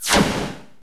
PERC, TECHNO; 90 sSampleCDs; Crypton - DrumBase5000

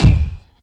voice bd, Yamaha TG-500

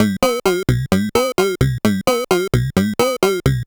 Sh101 Loop, 130BPM

